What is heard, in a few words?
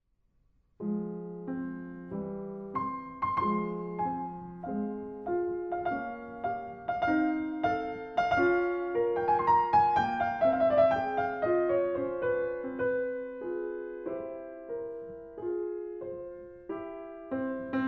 piano solo, keyboard; splendid variations and fantasies; haydn keyboard sonat